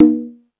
Latin Drums, E-MU Pro-Cussion

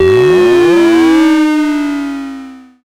slide

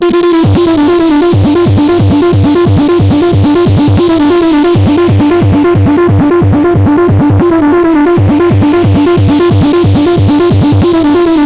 BURN FILT; Spectrasonic Distorted Reality 1

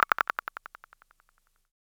Delayed click, Univox SR-95

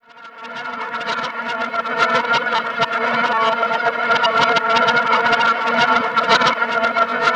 irritant, bizarre guitar; Spectrasonics

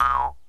JEW'S HARP

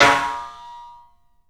CLUB SNARE; 3D Sound; 3D Drums

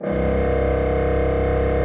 C1, Cathedral Organ; ILIO; Propeller Islands

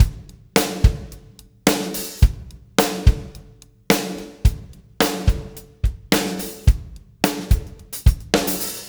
PULL, Backbeat, PULL UP; Spectrasonics

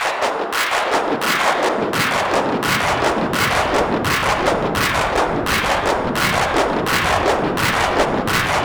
MEGACLAPS; Zero G; Malice In Wonderland

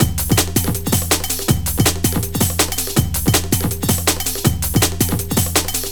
Ezee 162, Jungle Straight Loops; Zero-G Jungle Warfare 1, 2 & 3

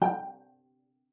G5, CELLO PIZZ; 90 s Sample CDs; Xsample VOL-5

